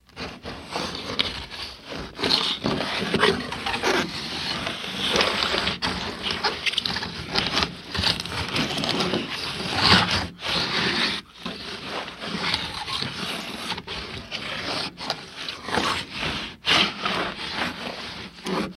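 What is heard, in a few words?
Drawbridge Drum Turn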